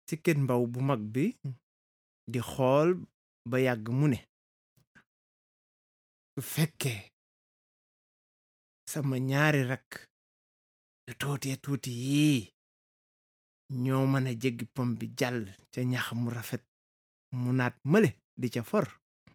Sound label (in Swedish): bip